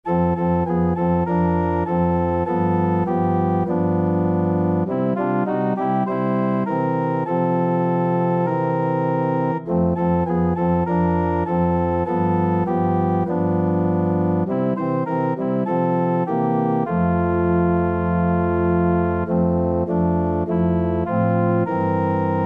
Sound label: organ